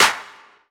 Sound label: wet clap sound g key; percussion; single hits one shots